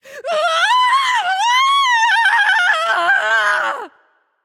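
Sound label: fear, female voice; smite; voicepack; hera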